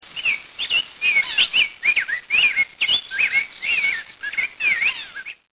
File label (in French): son cardinal poitrine rose